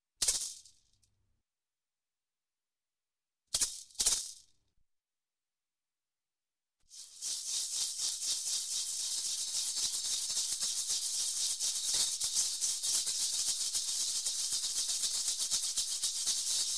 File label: Maracas shake, Music, Percussion; Sound Ideas 2000 Series